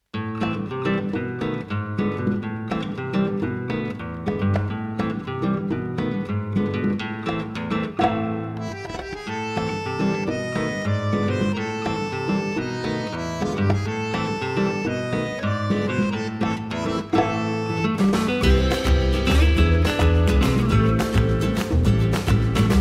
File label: Amazing Chill Guitar; CHILL OUT SUAVE